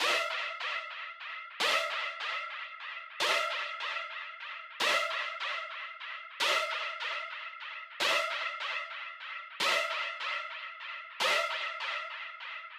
150 BPM F Min FX, Brass; Melodies; Loop Stems; Cymatics; Hype

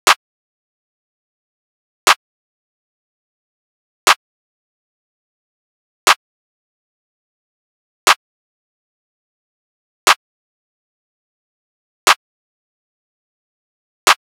Slow Crunch Drum Loop - 120 BPM Clap; Cymatics; Mystery Pack Vol 4

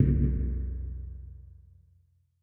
Wet Percussion; Hide

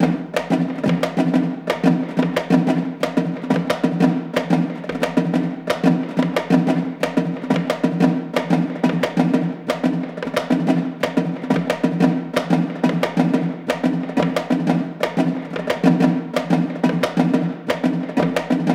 Dhols - 90BPM; Percussion; Ghosthack; SkyRealm; Percussion Kits; Kit 18 - Under Seige

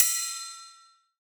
Ride; Raptor; Cymatics; Dubstep Starter Pack; Drums - One Shots; Cymbals

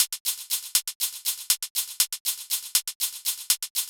Loop Percussion 120 Shakes, Deep House; Loops; Ghosthack; Advent Calendar 2019

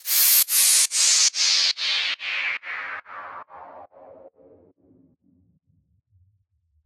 White Noise Down; Dubstep; FX; Cymatics; Dubstep Starter Pack

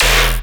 Bass Hit E, Dubstep; Glare Bass; Ghosthack; AC2024 - Day 13 - Dubstep Sounds 2025

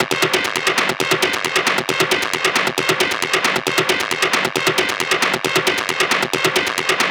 Perc Loop 135, Techno

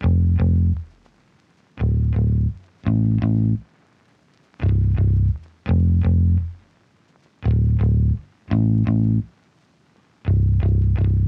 Bass, 85 BPM A Min; Stems; Cymatics; Cymatics - CHAOS Beta Pack (MIDI + Stems); Broken Record

